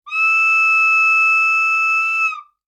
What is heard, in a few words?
Whistle flute